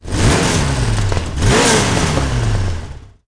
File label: stunt bike tap; sfx daredevil bart